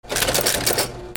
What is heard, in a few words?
INDUSTRY